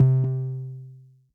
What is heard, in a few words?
Synth Prophet One Shots, Keys C; Electronisounds